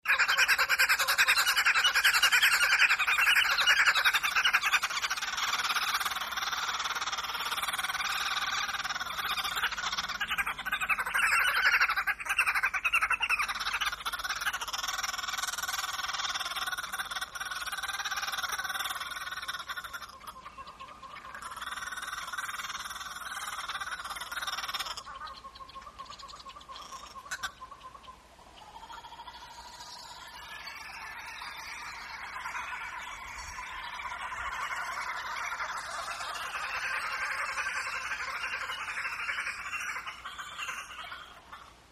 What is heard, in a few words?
Red Billed Wood Hopoe, wood hopoes african